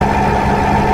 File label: car slow